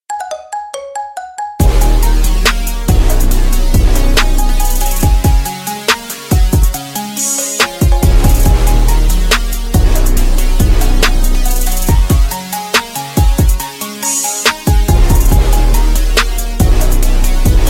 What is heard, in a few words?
rington iphone remix